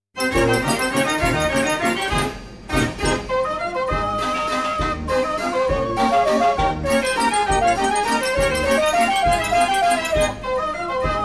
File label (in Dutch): Aubade d Accordeon, dansorgel; mortier; de hommerson mortier dansorgel